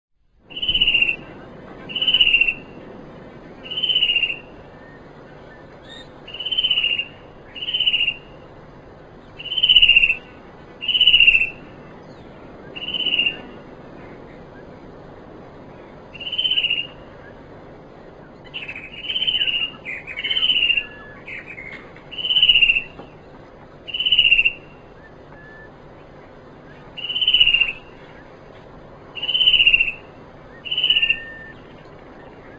fan tailed cuckoo

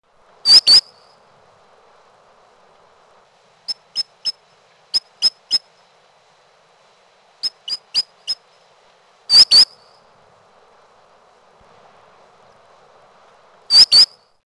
solitary sandpiper

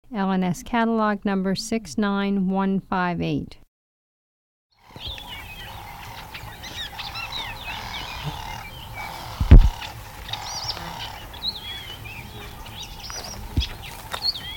meyers parrot

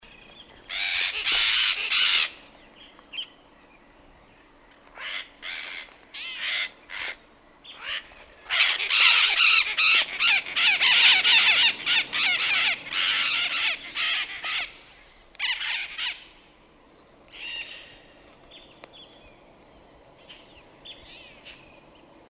blue crowned parakeet

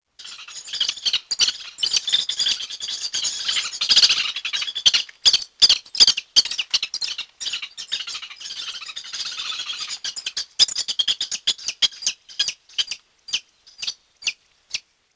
lewiss woodpecker

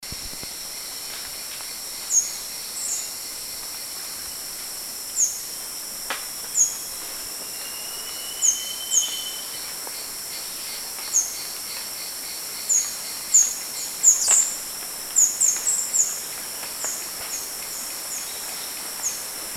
meeks pygmy parrot

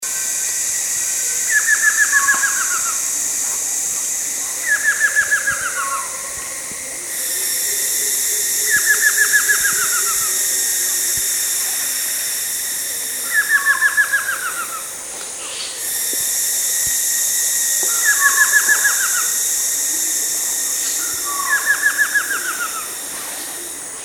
little paradise kingfisher

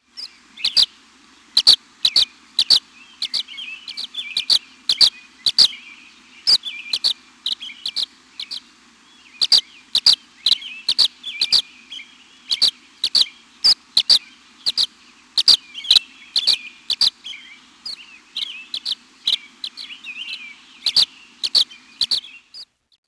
violet green swallow

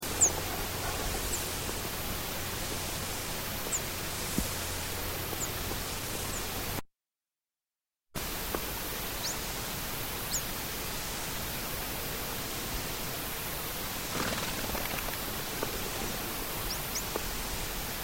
white winged diuca finch

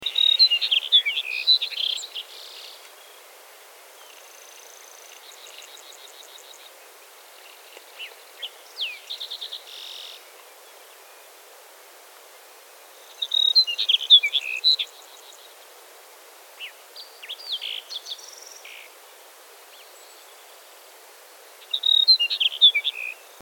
sage sparrow

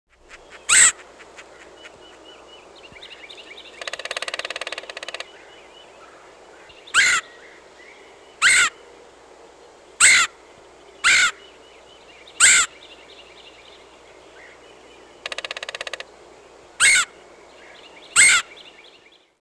red headed woodpecker

